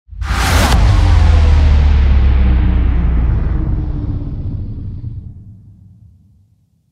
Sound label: Trailer Tools Bass Drop; Production Music; CATastrophic